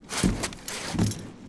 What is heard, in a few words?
PEACE WALK, Mob; HUMBABA